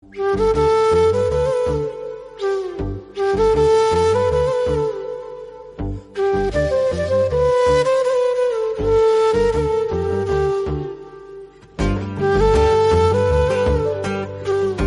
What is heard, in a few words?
Instrumental